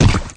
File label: limbs, flood